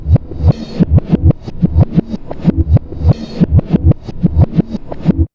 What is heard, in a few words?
DRMSND, Pure Electro, BEATKIT